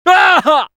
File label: Spy pain severe, fr